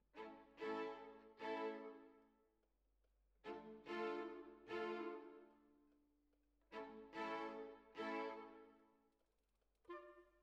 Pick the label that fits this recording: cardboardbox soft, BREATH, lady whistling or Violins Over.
Violins Over